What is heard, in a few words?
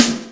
drum hit clap